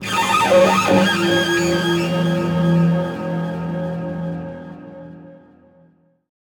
BGM stinger, BGM Player Dying In; Babylon's Fall

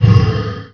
SFX Death; Ember

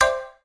Words Popping

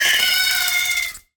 death, Flying Insects

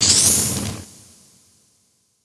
Collect Fire Eggs

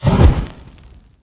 SFX Fireball hit

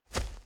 Footsteps Dwarf Dirt

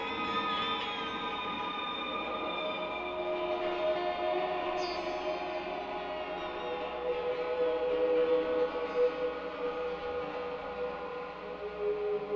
main menu music

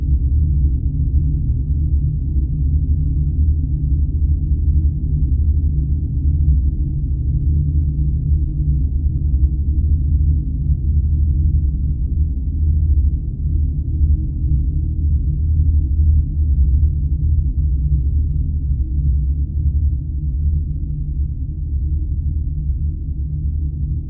ominous drone; Slain Back From Hell

